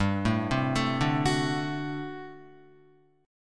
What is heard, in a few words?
G Major 7th Suspended 2nd Suspended 4th G down Guitar; GM7sus2sus4 arpeggiated